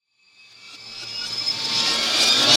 Dramatic Approach

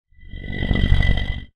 Sci-fi Transition

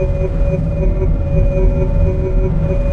Looping Sci-fi Portal Radiating

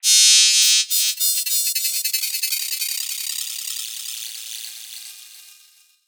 Sci-fi Unpleasant Grinding Buzz

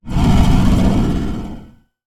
Swoosh Transition

